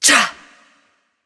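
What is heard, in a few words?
grunt attack, female; smite; voicepack; hera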